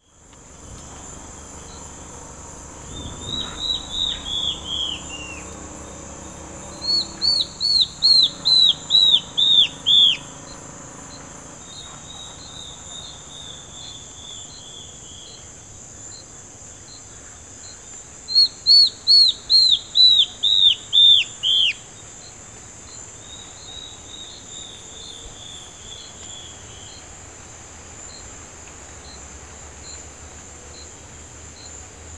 Yellow browed antbird; صدای پرنده مورچه مرغ ابرو زرد

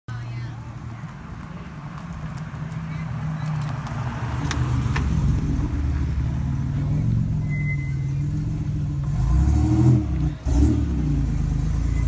Buick; اگزوز

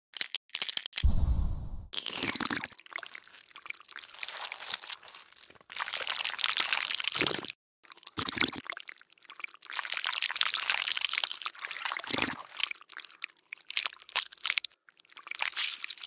Polish corrector; لاک غلط گیر

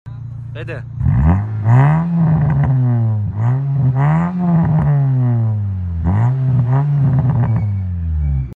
Tornado exhaust

